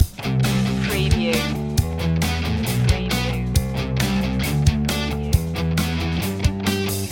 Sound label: loop